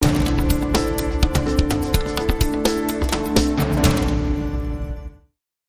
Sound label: Corporate Jungle Sting